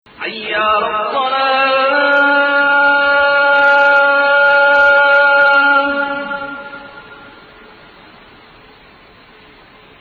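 Hijaz Adhan Hijaz, Adhan (call to prayer), prominent use of 5; Hijaz 1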